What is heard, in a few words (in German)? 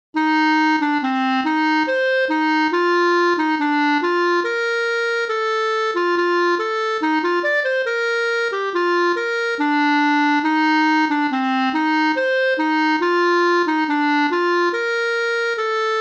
alter Nidwaldner Jodel instr